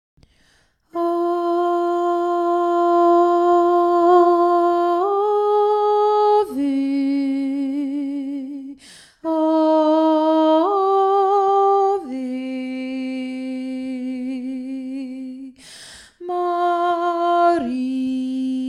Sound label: ave maria alto